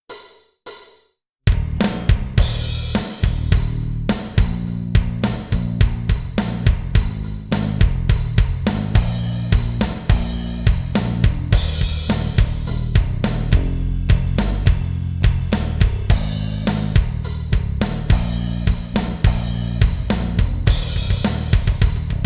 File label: bass guitar